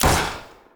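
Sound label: sniper rifle shot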